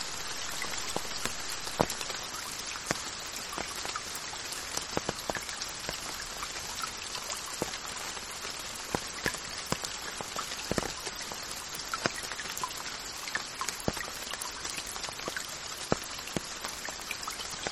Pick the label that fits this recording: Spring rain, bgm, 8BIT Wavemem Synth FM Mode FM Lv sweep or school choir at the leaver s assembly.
Spring rain